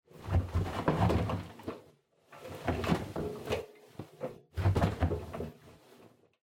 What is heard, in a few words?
Washer Front Load Clothes Rumage